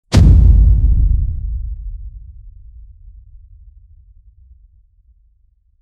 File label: venom tail strike impact, whip crack